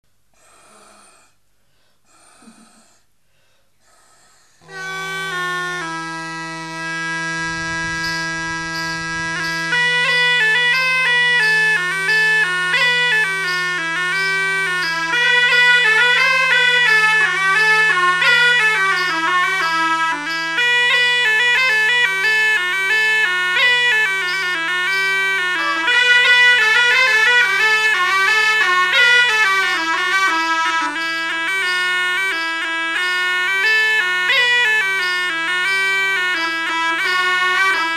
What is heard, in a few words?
Baggepypes and Shawm